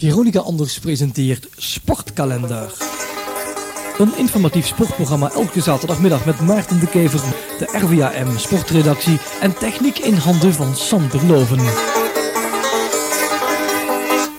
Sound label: Jingle; Sportkalender